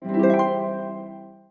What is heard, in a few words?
harp